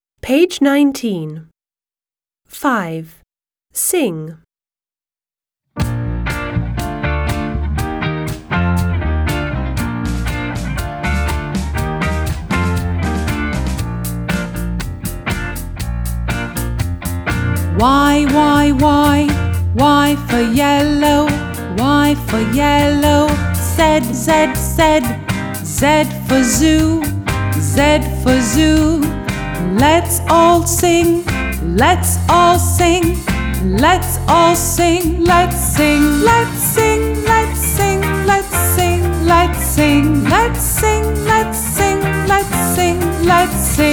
British song Y to Z; My alphabet book; Smart Book 1

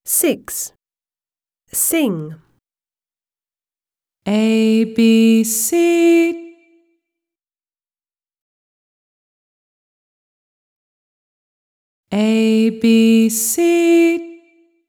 song ABC, British; My alphabet book; Smart Book 1

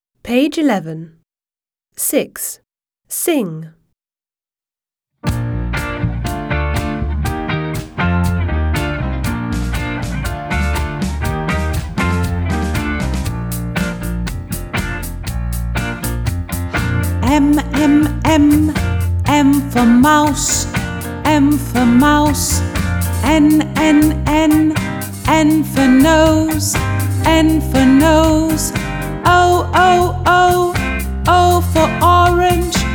song M to O; British; My alphabet book; Smart Book 1

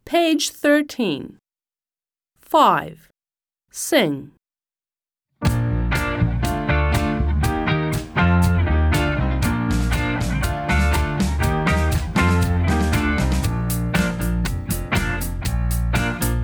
song P to R, American; My alphabet book; Smart Book 1

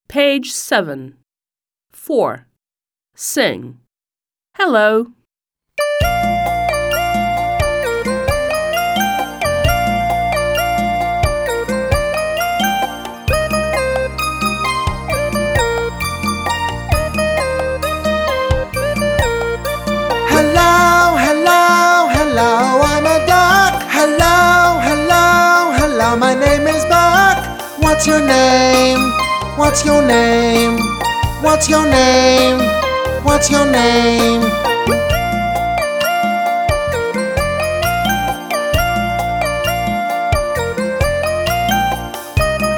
American song Hello; My alphabet book; Smart Book 1

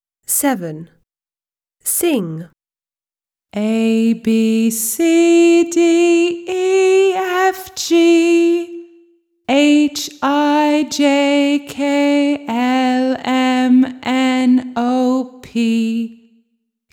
song ABCDEFGHIJKLMNOPQRSTUVWX, British; My alphabet book; Smart Book 1

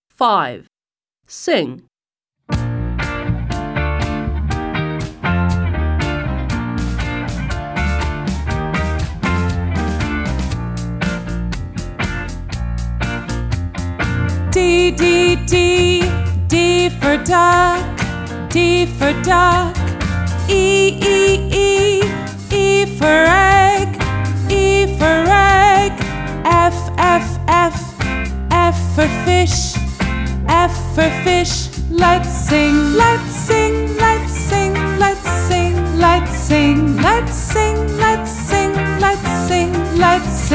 American song D to F; My alphabet book; Smart Book 1

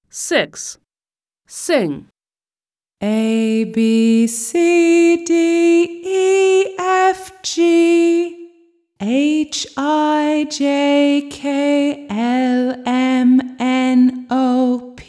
American song ABCDEFGHIJKLMNOPQRSTU; My alphabet book; Smart Book 1

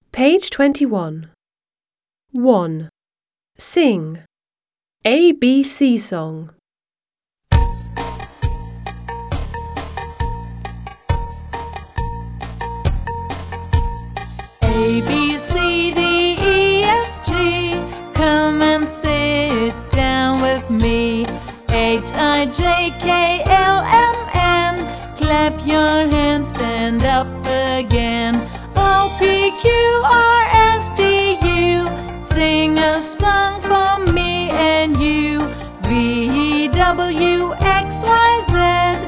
song ABC song; British; My alphabet book; Smart Book 1

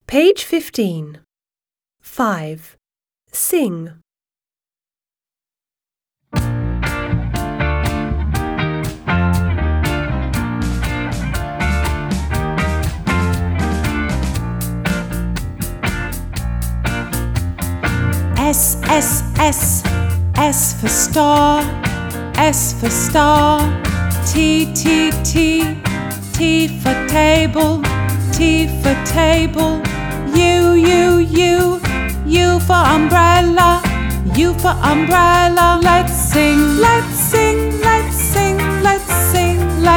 song S to U; British; My alphabet book; Smart Book 1

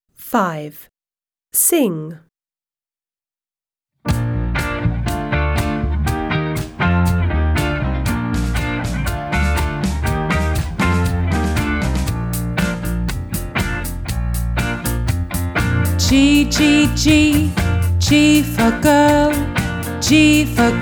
alphabet song G to I, British; My alphabet book; Smart Book 1

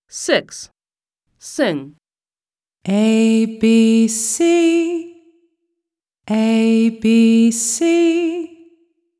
American song ABC; My alphabet book; Smart Book 1

